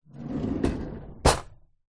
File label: household drawer cutlery open; zapsplat